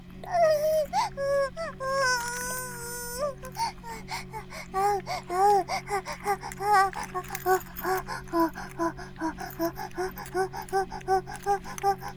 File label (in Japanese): バイブ放置, ASMR